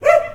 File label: Dog Bark; DogBarks 1.0